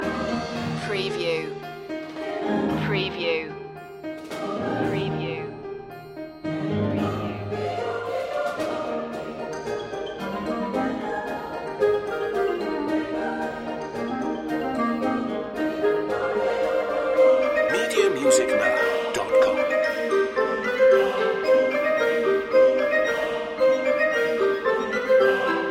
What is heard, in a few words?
toys marching loop